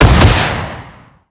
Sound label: Drone Missile Attacking; blue archive